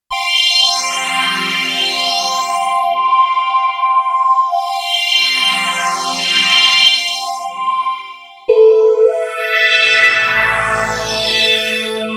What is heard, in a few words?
STREICHFETT